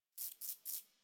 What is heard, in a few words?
Forest Rodent Vocal Idle; Characters; Warframe